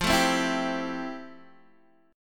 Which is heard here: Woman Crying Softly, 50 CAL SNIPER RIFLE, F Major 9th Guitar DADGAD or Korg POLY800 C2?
F Major 9th Guitar DADGAD